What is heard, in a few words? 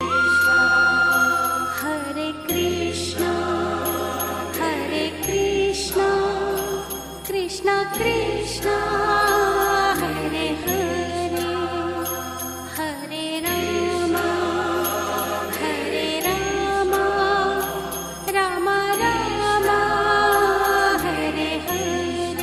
hare krishna hare krishna ringtone